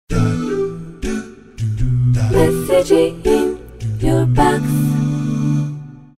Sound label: A cappella, alert tones; Samsung SCH-W689 Wave